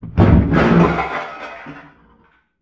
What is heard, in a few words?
murder collapse